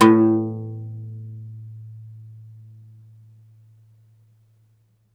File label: OUD A1 -R; E-MU Producer Series Vol. 7 – Old World Instruments